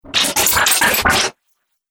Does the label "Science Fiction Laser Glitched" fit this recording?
Yes